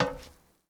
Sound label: Footsteps Iron plate One